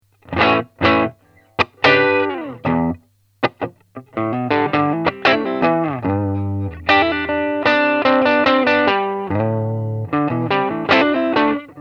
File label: Clean